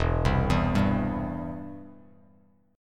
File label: E Minor Sharp 5th E down Bass Standard; Em#5 arpeggiated; JGuitar